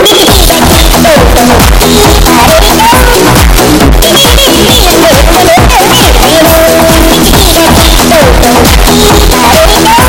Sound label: bass; ham